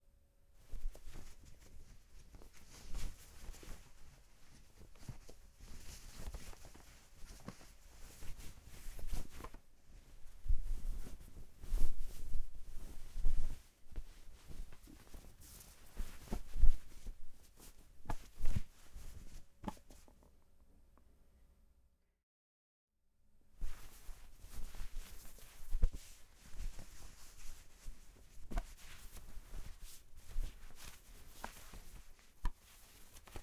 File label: Clothing And Punching